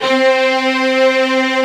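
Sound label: strings C3; STRNGS; AKAI S-Series CD-ROM Sound Library VOL-8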